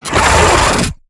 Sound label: Pain Light; Evolve; Gorgon; Lurker